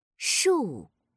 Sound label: stroke